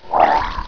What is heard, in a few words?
gurp; ratamahatta